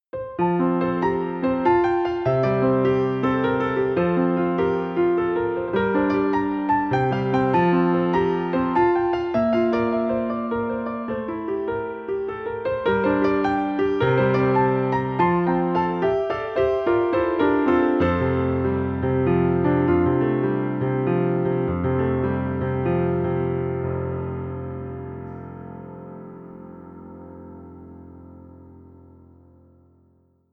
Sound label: Piano; vol red